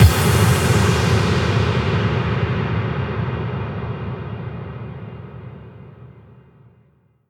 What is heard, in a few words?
Impact; Vengeance essential club sounds-3